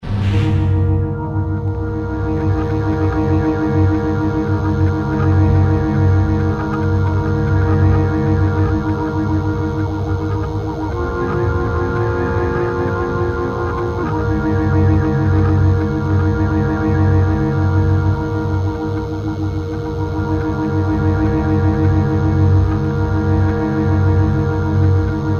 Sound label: childrens marching song